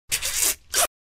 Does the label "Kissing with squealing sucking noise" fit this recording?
Yes